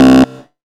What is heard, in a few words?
BASS